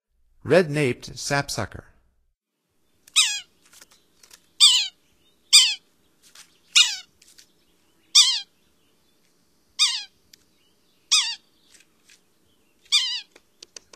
Red-naped Sapsucker, Songs of Birds of North America; Stokes